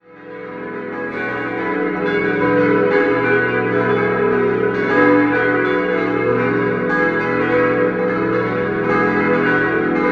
Tittling, glocken

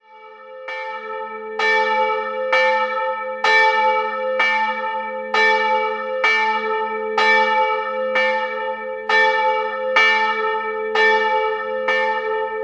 Manching, Ev. Christuskirche, glocken; Marktgemeinde Manching

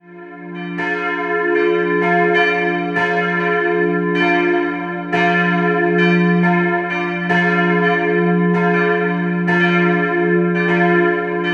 Regensburg Benedikt, Filialkirche, Glocken; Oberisling, Filialkirche St. Benedikt; Regensburger Stadtteil Oberisling

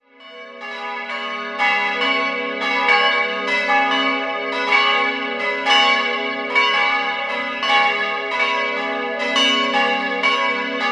Unterreit, Glocken

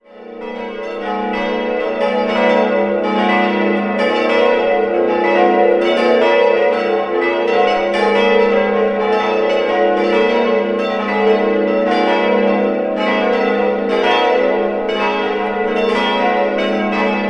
Pemfling, glocken

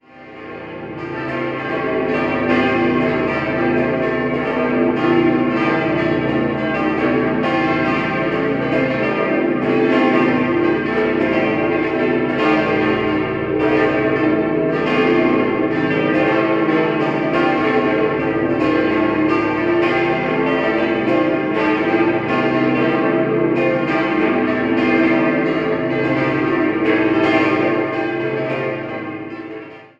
Sempach, glocken

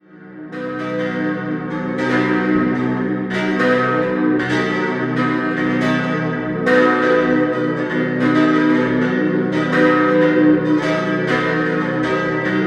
Axams, Glocken

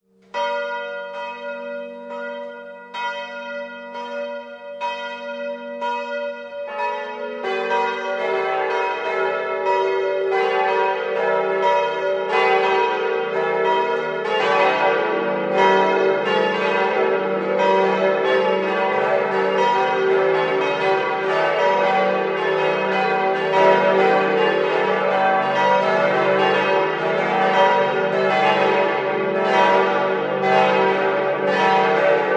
Glocken, Pfarrkirche St. Canisius; Ingolstadt; im Jahr 1972 in Heidelberg gegossen; 1956, 1350, 798, 648 und 465 kg; Ringsee; Karl Stumpf

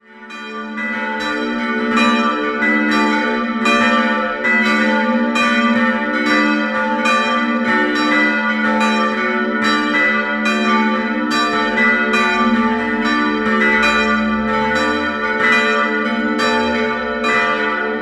Wachstein, glocken

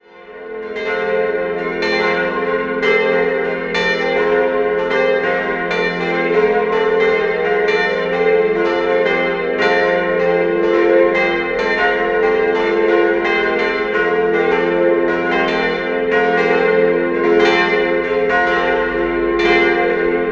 Donauwörth Berg, glocken

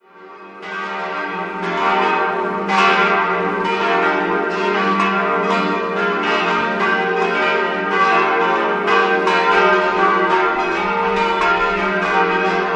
Roding, glocken

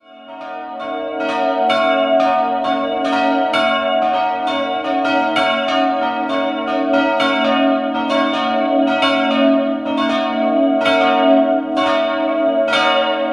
Oberhinkofen, Glocken

